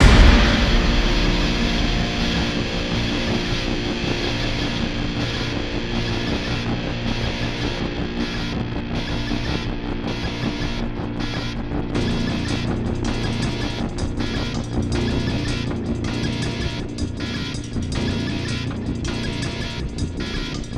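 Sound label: music power guitar loop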